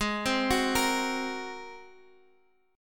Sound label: G sharp 9th, Guitar, Standard; arpeggiated; chord; x,11,10,11,11,x